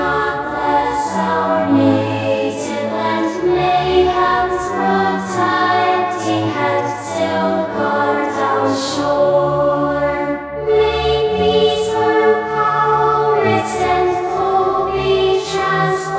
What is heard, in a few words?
choir